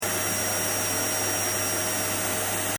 Vacuum (Domestic): Running; Appliances; Household; Appliance Sound Effects; Appliances (Sound Effects:Household); Stuart Duffield

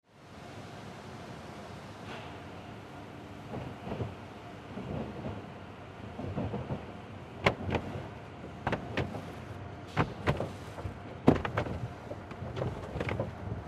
Car Wash Automatic Interior Perspective; Transportation Automobile

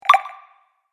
Fantasy Game Collect Sound, video game

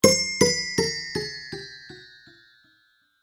Ding Bell Delay Decay; Bell Sound Effects; musical

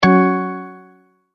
multimedia tone exclamation; McKinney Sound

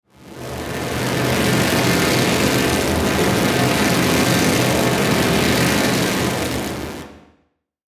Static Slow Swell, Digital Static Fuzz Noise; Production Element Imaging Element Accent Transition; Blastwave; Static Sound Effects

